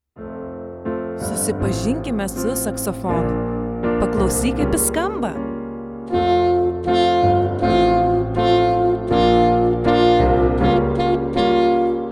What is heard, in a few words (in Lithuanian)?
Saksofonas; Susipazink su instrumentu